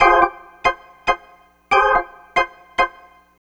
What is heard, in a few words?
Organ, Loops; Trip-Hop